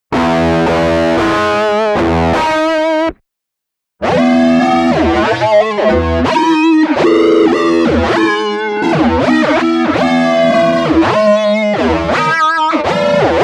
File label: Whammy Flange